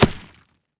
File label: ground hit, damage; DCS